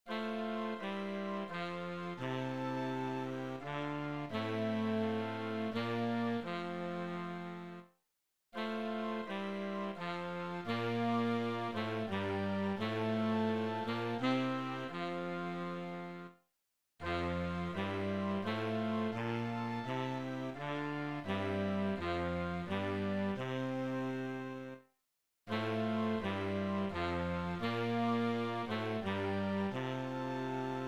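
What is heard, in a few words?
tenor sax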